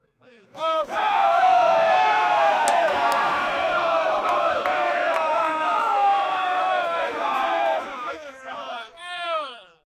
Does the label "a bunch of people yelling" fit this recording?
Yes